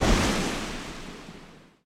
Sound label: Hand Glitch Back; Game rip; MiSide